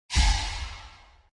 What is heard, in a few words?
Fight Dead